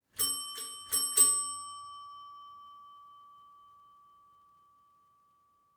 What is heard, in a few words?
Hotel Bell on Reception with room ambience